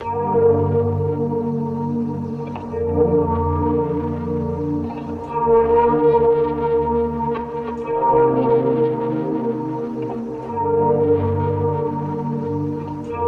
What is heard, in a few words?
drowning gtr, 92